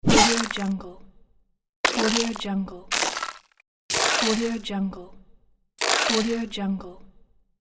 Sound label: freeze; freeze pack